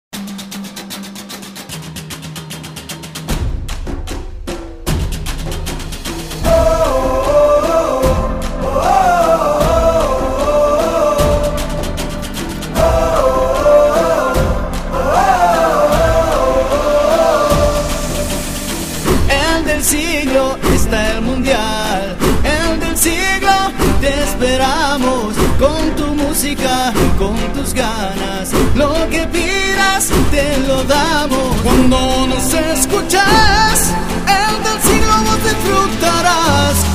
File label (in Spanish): jingle mundial para radio